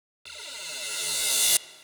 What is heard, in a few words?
reverse